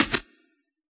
SCR, Yamaha DJX, B900 Kit

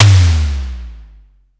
Simmons Tom

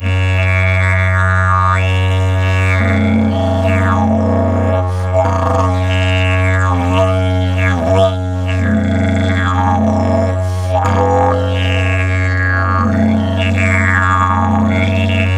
F# LONG DRONE, Didgeridoo; Big Fish Audio - Didgeridoo and Other Primitive Instruments

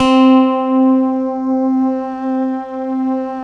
SYN JD8000, Roland; Sound & Vision - Gigapack I CD 2; JD 800/990

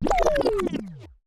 scratch; Roland MC-909

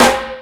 Mega Drums, E-MU Pro-Cussion; drum machine

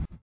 collision; Spectrasonics; Metamorphosis

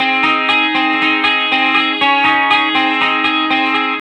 BYRDY RIF 1 GS, Vintage Blues Guitar, 120; USB Soundscan vol.22; AKAI

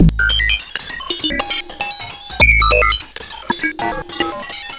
funky robot, 100bpm

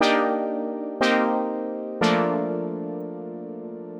120 Pad Chords C, Keys, Nu Jazz House; PowerFX

